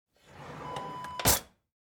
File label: kitchen drawer open